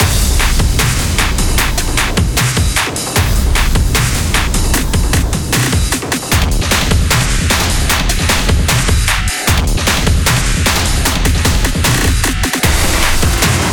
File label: Battle Metro; let it die